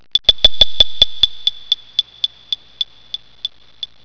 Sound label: ticks; quake; fortress; haunted